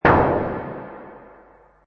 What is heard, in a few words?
Space Scifi Sound White Blast; Sci-fi space white noise burst; Sound design element for space blast or exp; Lynne Music